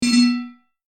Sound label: Round Complete; disneyxd; bryx